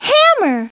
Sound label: hammer; Treasure Galaxy